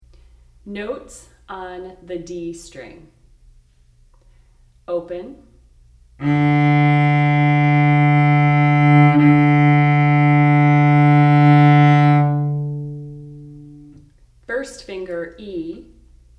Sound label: Notes D String, cello; Notes on the D String